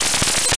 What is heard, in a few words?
electronic shocks